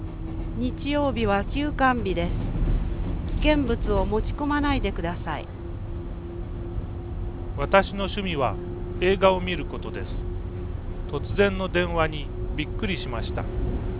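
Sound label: train, noise canceller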